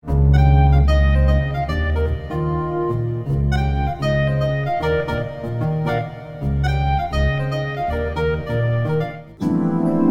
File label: flutes